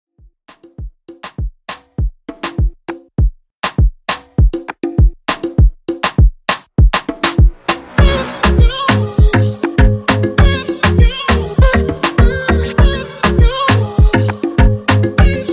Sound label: Afro Drum 100Bpm; Top40